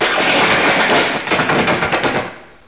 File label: zap, debris